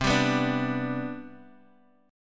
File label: C Major 7th Suspended 2nd, C, down, Guitar, Open D